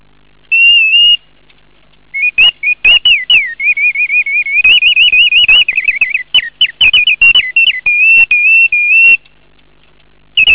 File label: bird song